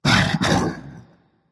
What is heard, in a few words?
clear throat berserker; cuchulainn